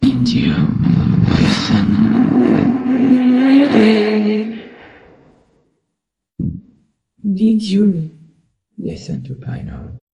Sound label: jingle